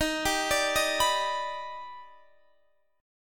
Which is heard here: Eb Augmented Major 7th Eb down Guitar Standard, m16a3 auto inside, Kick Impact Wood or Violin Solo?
Eb Augmented Major 7th Eb down Guitar Standard